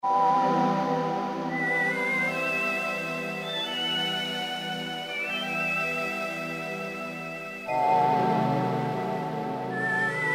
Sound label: Kawai K1m